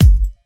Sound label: acoustic kick single hit g sharp key